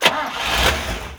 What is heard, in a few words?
DPV Startup; Vehicles; WarRock